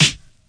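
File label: classic hip hop snare; Classic Hip Hop Mega Kit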